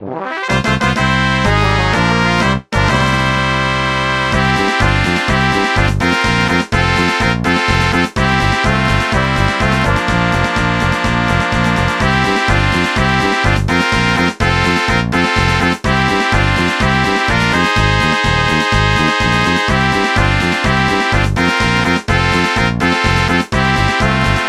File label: Fanfare